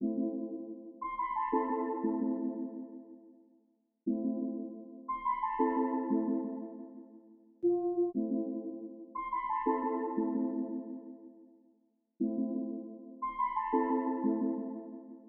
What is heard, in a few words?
118 BPM A Min Synth; Trap; Loop Stems; Cymatics; 2022 Melody Collection; Trap Pt 2; In The Cut